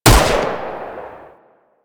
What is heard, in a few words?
Rifle Shot; Crp Ruban Rifle